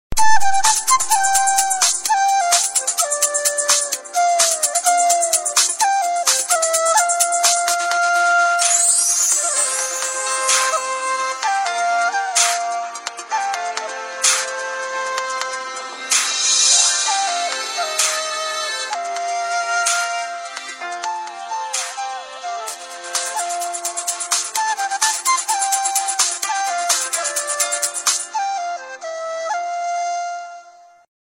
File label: Original Flute, ringtone; Samsung